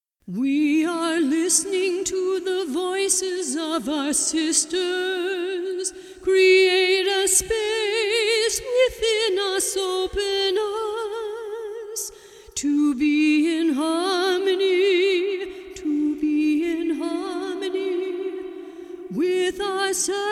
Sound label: harmony